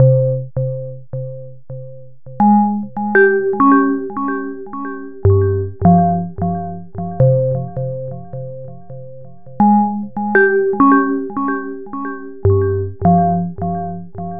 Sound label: Bells 100